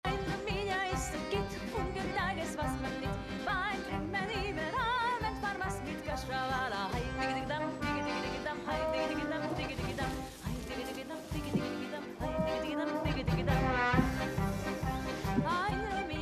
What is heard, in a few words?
Swing